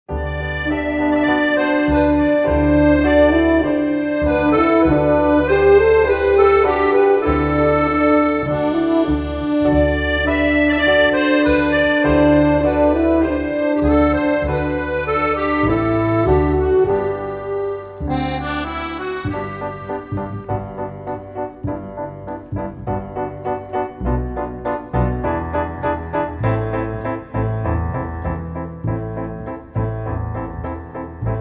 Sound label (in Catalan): Gomuta sense flabiol